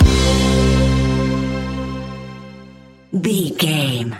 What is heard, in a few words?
shimmering dancefloor stinger